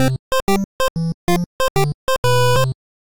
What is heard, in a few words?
game over; Raimon Zamora